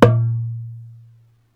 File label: darbuka note